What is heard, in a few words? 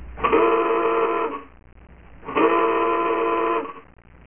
klaxon